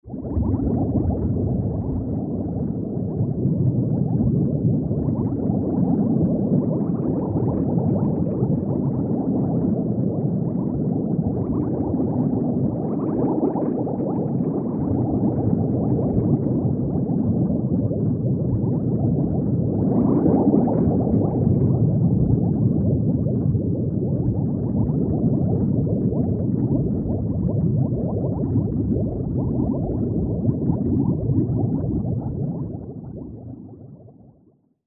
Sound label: liquid